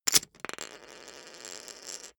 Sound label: poker chip stack rattle short fall